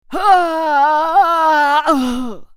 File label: dying; MetalWingedJudicator; Character Voices - English